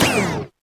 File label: Grappler drop; LittleBigPlanet 2; PS3